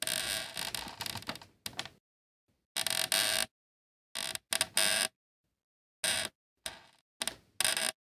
creak1, household; NS Studios - ultra sound collection

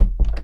door close, household; NS Studios - ultra sound collection

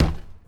Street Ball Glass Back

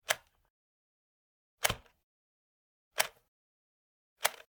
Generic Button Plastic Small

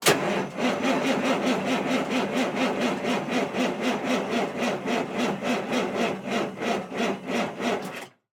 Semi Truck; Large Vehicles; Blastwave

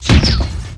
Grenade Launcher Shot; SFX Kit; Weapons

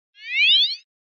Comic Slide Short Going Up; Hollywood Edge; sound designer's toolkit v2

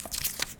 Pickup Paper Note; Pickups

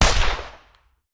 Hand Gun; Sound Ideas; Guns

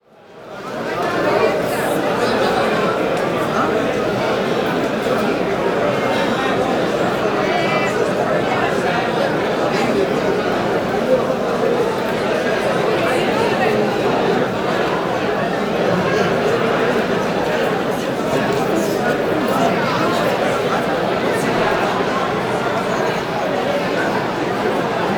Crowd Indoor Large Indoor Marketplace, Walla; Sound Ideas; Walla Series

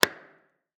Semi Truck Tanker; Large Vehicles; Blastwave

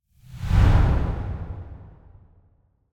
Whoosh Deep Air Whoosh; Hollywood Edge; sound designer's toolkit v2

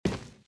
metal; firefight

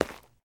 zombie footstep concrete; DeadFright

